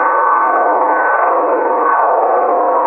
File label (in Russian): Glushilo